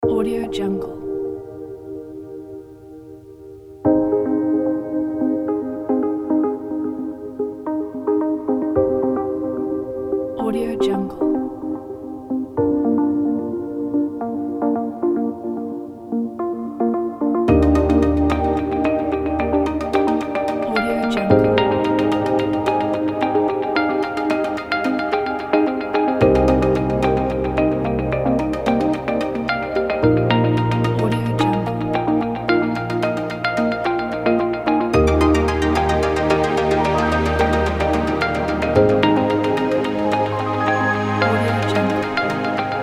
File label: soft corporate pack